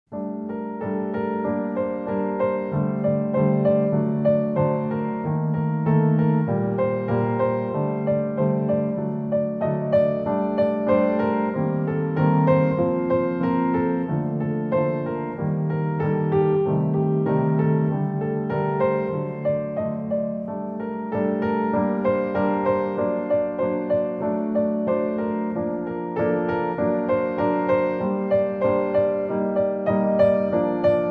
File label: Piano